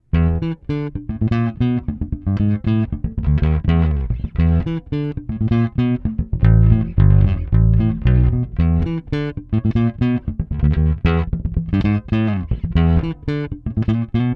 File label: PJ Neck